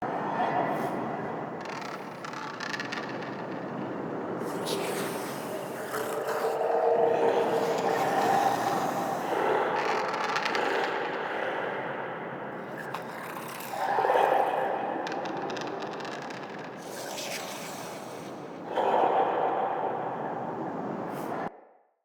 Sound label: Corrupted Exterior Bed Loop, Ambience